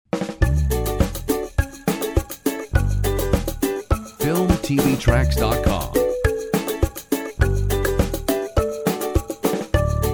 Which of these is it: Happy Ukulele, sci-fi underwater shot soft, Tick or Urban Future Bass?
Happy Ukulele